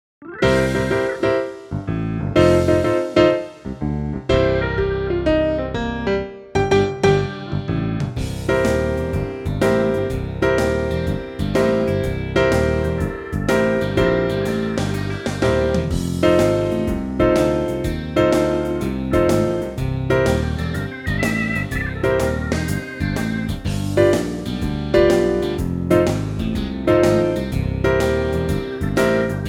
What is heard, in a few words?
Blues, recorder